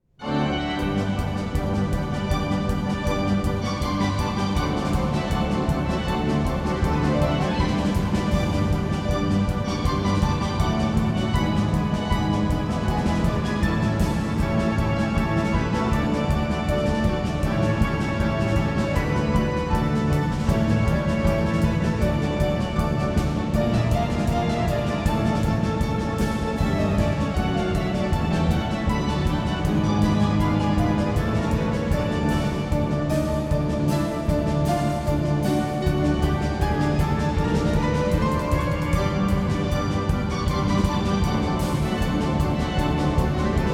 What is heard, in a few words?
Polka; Tritsch Tratsch